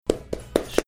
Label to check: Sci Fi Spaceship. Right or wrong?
Wrong